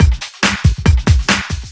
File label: Loops BB 140, BreakBeats; Vengeance Samples